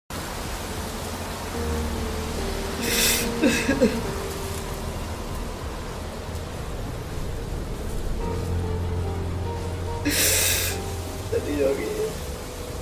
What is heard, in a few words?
crying; carryminati; green screen audio meme